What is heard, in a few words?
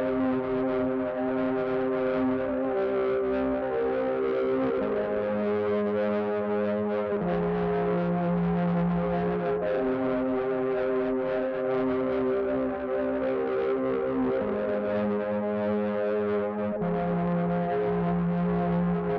150 BPM C Min MemoryMoog Chords; Synth; Loops; Loop Stems; Cymatics; Fantasy Synth Sample Pack